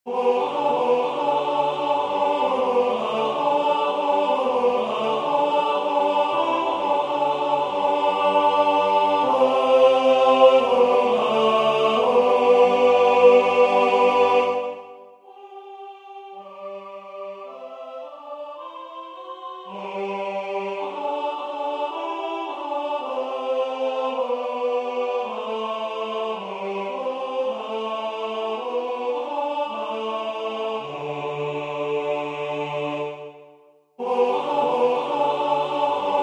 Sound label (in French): tenor; Regina coeli; Aichinger